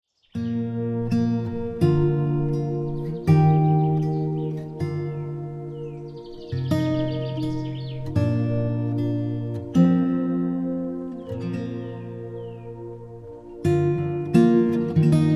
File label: Twinkle Twinkle Medley